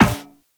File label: Run Metal Perforated, Footsteps